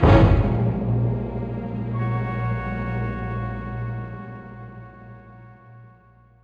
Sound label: Death - game Over; Star Wars - Shadows of the Empire [N64]